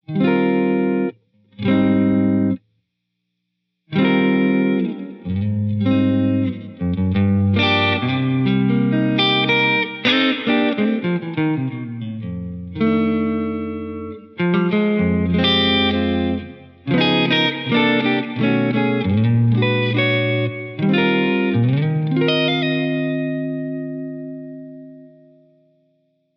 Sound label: Hall Concert Low Early Reflections, reverb